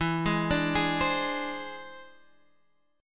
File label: E Augmented 7th E down Guitar Half Step Down; E+7